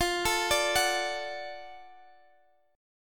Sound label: F sharp Minor Major 7th Sharp 5th F